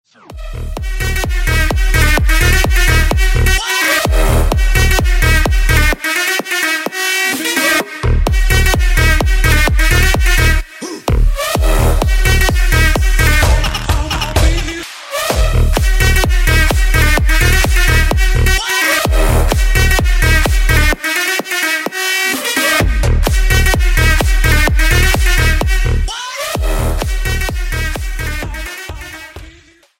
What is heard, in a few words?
bounce